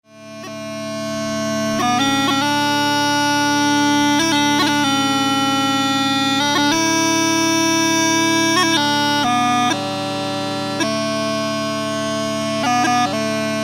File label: She Moved through the Fair on Small Pipes; Wedding bagpipe music